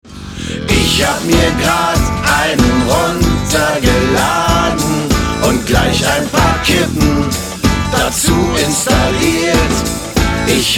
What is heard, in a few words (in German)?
Universal Telefon